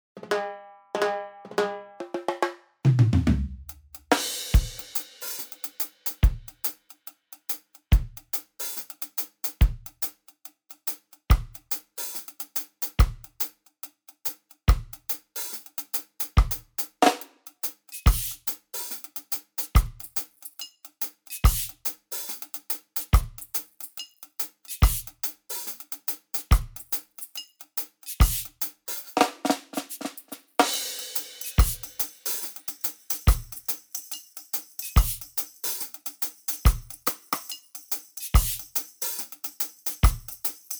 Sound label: drums reggae standard; handy drums